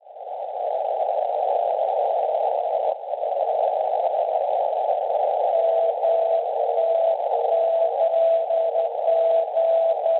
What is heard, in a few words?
NDB, 355 kHz; ONW; Antwerpen; Belgio; Schwarzsee